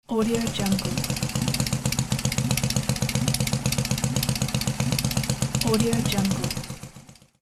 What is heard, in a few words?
idle speed of the motorcycle